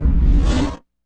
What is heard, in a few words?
IMP FLAME, Cinematic Impact; M-Audio Pro Sessions; Liquid Cinema